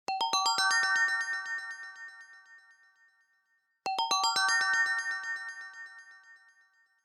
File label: Ripple, ringtones; Honor Pad X7